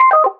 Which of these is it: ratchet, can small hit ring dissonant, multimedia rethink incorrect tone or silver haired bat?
multimedia rethink incorrect tone